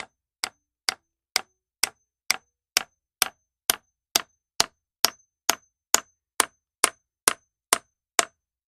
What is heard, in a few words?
Hammer Nail Wood; hammers